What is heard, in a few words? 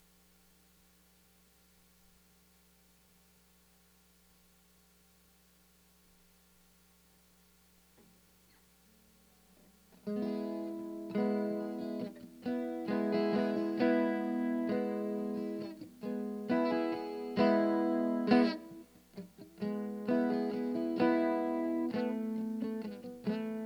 rain acoustic